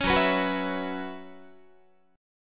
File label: F sharp 7th Suspended 4th, Guitar